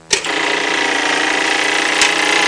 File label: jigsaw (short), Household; Sound Library 2000; Wayzata Technology